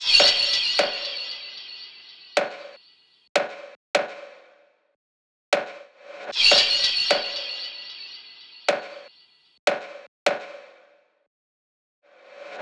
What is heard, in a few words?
loop perc 152; ceres